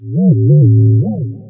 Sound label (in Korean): 고성방가